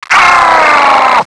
death; svencoop; scshogo; fallenstrooper

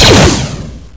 shock fire; svencoop; shocktrooper

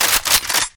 weapon pickup; svencoop; morass beta

